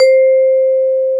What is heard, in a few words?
C PERC; AKAI S-Series CD-ROM Sound Library VOL-8; SET#1 C PERC